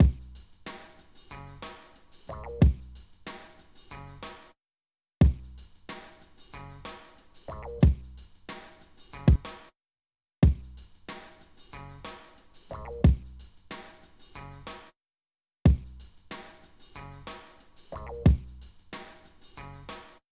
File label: Melody